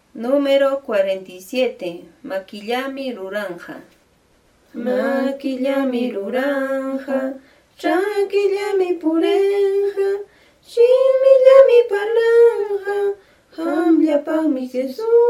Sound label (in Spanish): cancion